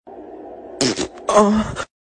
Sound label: fart sorry wrong person